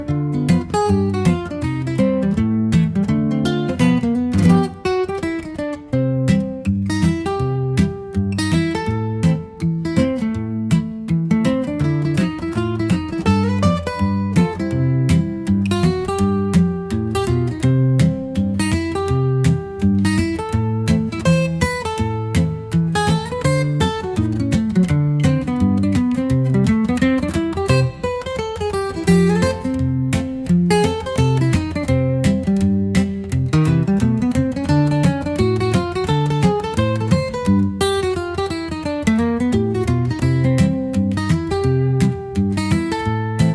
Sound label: background loop